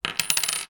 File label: foley money coin single down on table; zapsplat